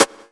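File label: snare drum, Drum & Bass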